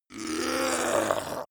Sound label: yeti grunt pain; kreaturen